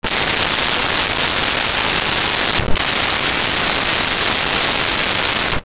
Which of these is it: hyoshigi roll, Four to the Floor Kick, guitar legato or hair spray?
hair spray